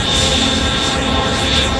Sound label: speeder